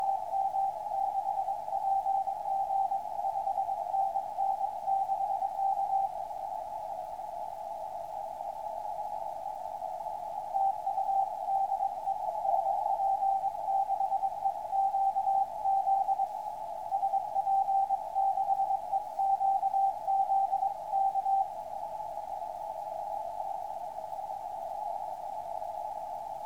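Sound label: 160m, signals on 160m band; SP6EQZ; RAØFF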